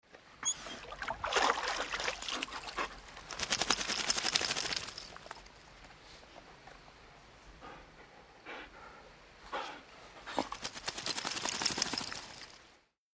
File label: Dog Leaves Water Whin; mvmt